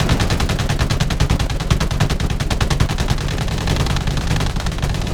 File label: mc loop; planes; gmod; lvs planes; weapons